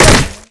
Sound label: bullet impact crate; weapons; Modern Warfare